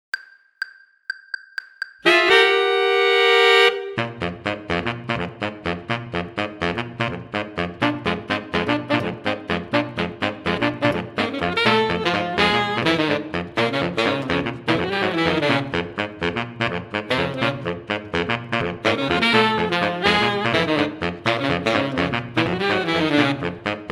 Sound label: playalong alto